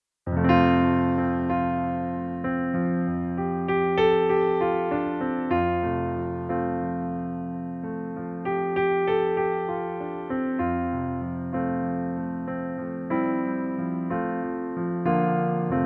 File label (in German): Hochzeitsversion Pianoplayback in F-Dur